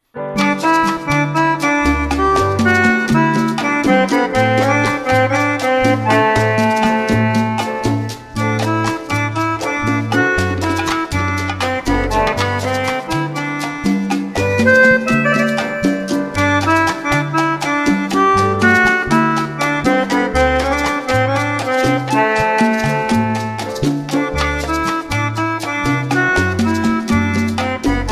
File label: Samba